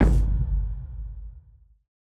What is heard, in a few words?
BigFoot Stomp; Nova Sound